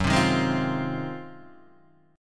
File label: G sharp 13th F sharp